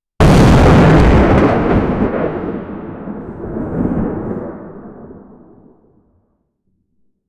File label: laser sound then thundero, thunder